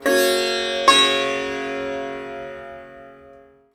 SITAR CHORD; SITAR CHORDS

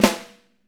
6X12 ALUMNUM, Living Drums

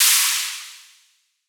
drum cymbal crash; Virtual Riot; Drum Hits

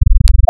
AM Iterative AM, wavetables; Architecture 32

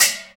lid, Trash Tuned; Roland L-CD701; Trash+Kitch

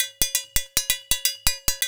JU JU, African Contemporary

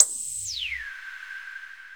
Korg Z1

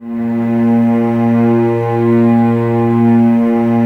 Cellos A#2, String Ensembles, No Vib; Miroslav Vitous

